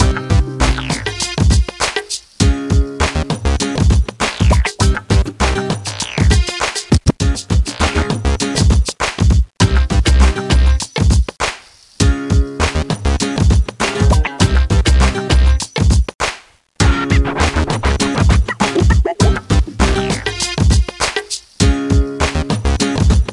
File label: Hiphop Boucle; ener g dance squad imagine modern dancer 2008 nintendo ds gamerip